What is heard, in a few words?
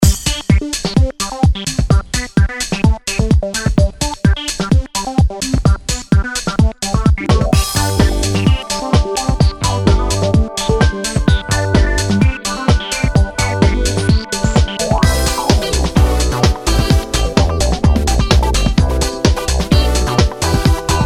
BGM Bright Field